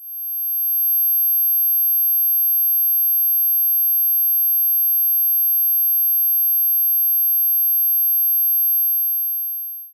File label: 1,25 Khz; Tестовые сигналы для настойки акустических систем.; Фиксированные частоы.; Tестовые сигналы и звуки.